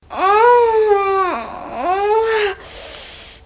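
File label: orgasm female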